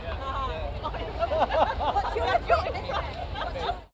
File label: people; Mafie 1